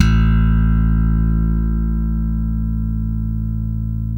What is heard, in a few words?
6 STR FUNK; Spectrasonics; Bass Legends